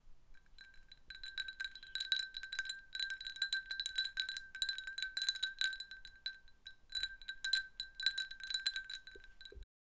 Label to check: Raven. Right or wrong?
Wrong